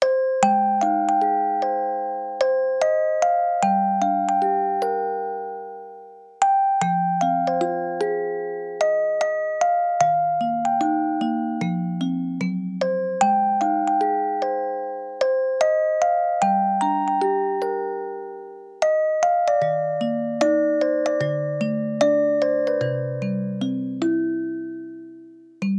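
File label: organ version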